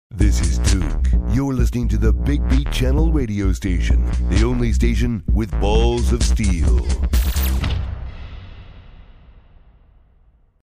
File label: Jingle; Duke Nukem